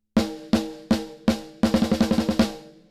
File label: Fat Metal Snare; Pulsar 1178